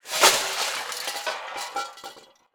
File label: metal crash, metal pots crash